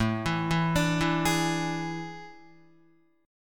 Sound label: A Major 7th Suspended 4th, Guitar; arpeggiated